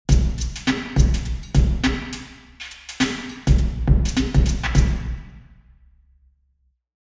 drum, church; St Andrews

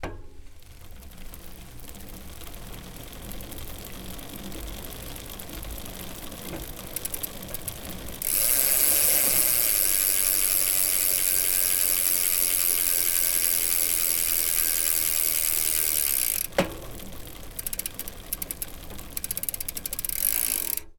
bike, anechoic bicycle; OPENAIR

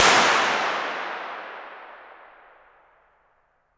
marble hall; elveden hall suffolk england

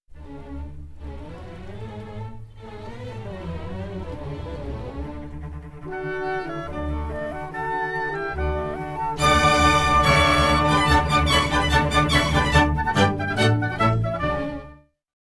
Orchestral, Anechoic; OPENAIR

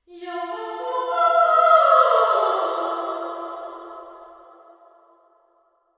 marble hall sing; elveden hall suffolk england

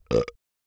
burp; OPENAIR; Anechoic

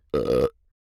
burp; OPENAIR; Anechoic

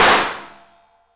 hats cloaks, elveden hall; suffolk england

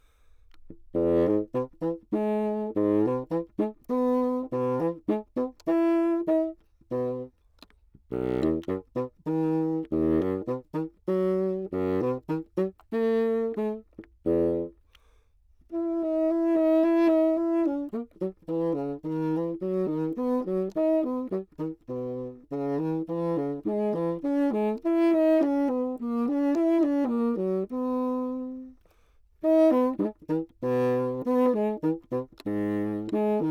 piece, classical bassoon, Anechoic; OPENAIR

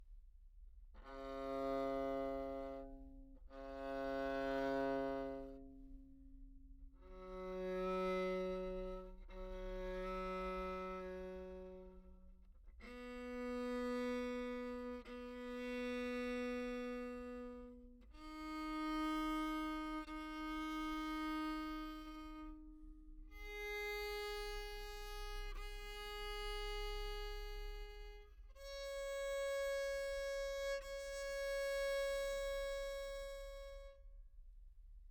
open, treble viol, Anechoic

